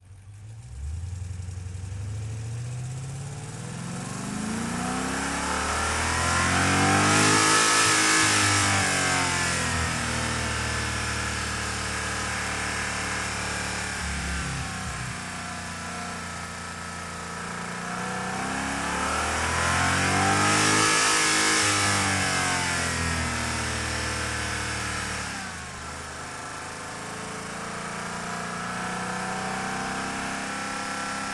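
Jeep SRT8 onboard fast drive short stop engine right; dpa4061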